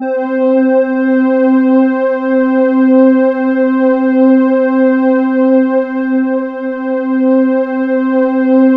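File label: square, synth line; 90 s Sample CDs; NiceBeats; SynthLine VOL-2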